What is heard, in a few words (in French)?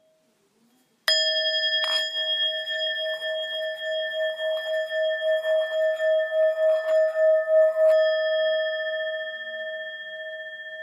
Bol bengale la